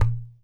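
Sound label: IPU GOURD, HAWAII PERC; AKAI S6000 CD-ROM; WorldSounds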